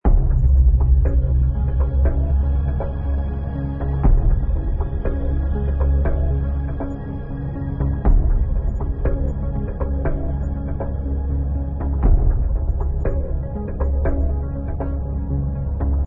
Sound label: Dice BGM Loop